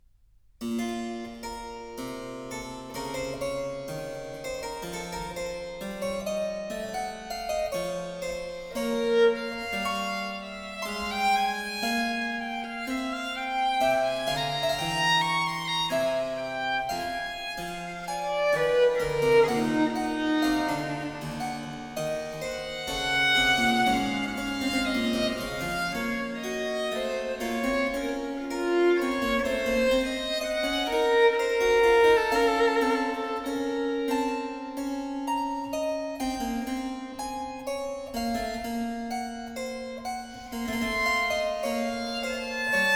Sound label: Sonata No. 6 in G Major, Adagio, Violin and Harpsichord; BWV 1019; Bach; J.S. Bach